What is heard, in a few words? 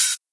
hat